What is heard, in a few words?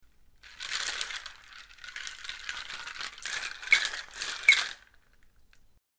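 bar shaker sounds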